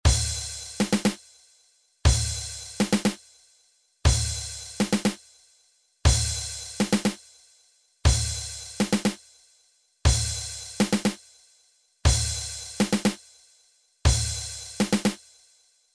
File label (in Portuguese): Toque; fanfarra